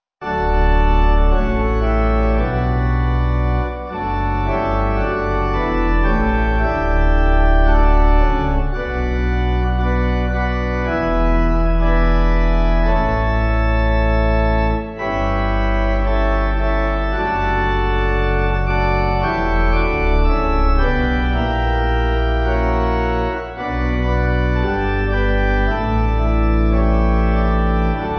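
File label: Pipe, hymn backing; Berwyn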